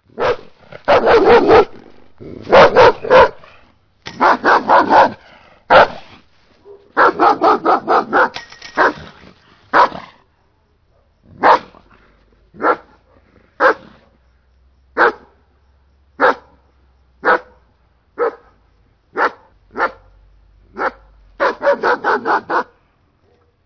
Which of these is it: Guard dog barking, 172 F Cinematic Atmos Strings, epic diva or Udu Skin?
Guard dog barking